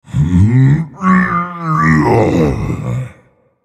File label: Vocal Colossus Pushing Blocks; gamerip; Minecraft: Story Mode